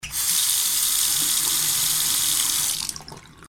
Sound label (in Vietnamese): tieng am thanh tat khoa voi nuoc